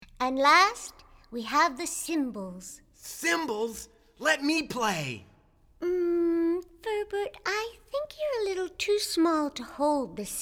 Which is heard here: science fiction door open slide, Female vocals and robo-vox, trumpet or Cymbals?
Cymbals